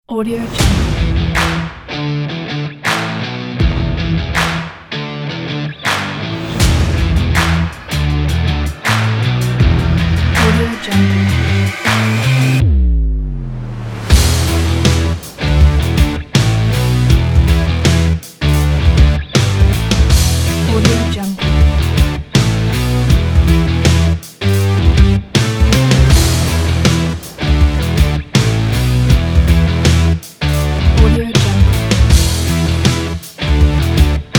energy indie rock sport

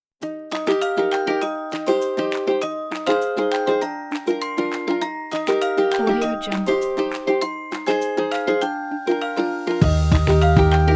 happy children background

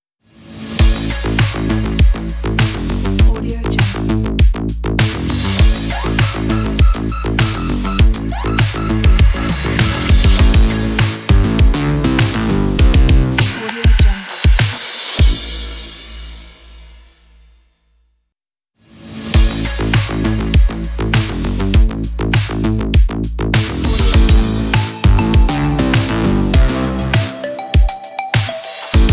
80s logo

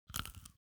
human mouth soft crunchy bite; smartsoundfx

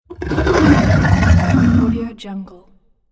dragon growl

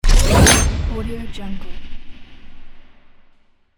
futuristic mechanical hit

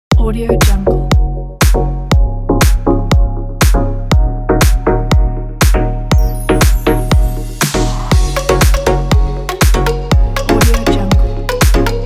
summer lounge

